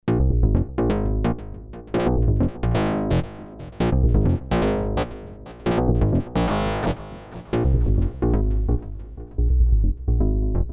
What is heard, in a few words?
yamaha dx 200